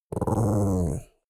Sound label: chihuahua growl; natur-tiere; hunde